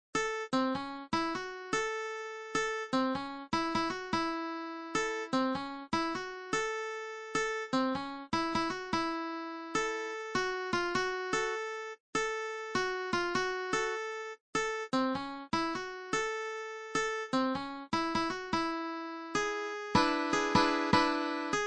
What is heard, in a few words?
12 Bar Blues in A Intermediate, uke; workshop; Fingerstyle Ukulele for Beginners; Soprano, Concert and Tenor ukuleles